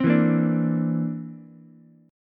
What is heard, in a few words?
D 7th Suspended 2nd Sharp 5th, Ukulele; strummed; JGuitar